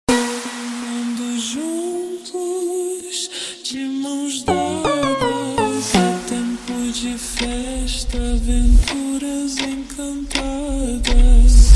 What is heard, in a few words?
ultra Slowed, funk